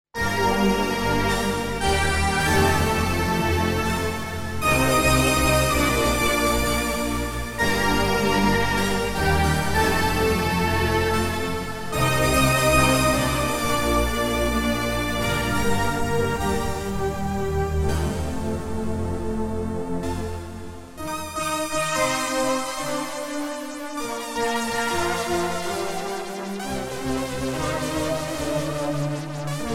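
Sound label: Logos, yamaha sy77 pads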